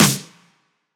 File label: snare